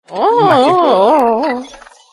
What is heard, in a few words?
ooo